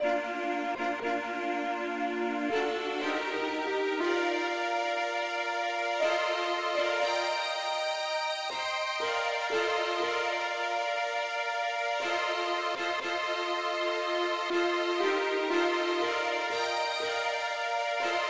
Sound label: mandolin Lead, key bes